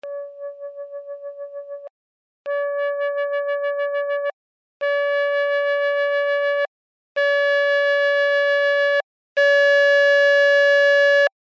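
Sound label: soft to hard, woodwinds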